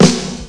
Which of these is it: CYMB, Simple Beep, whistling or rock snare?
rock snare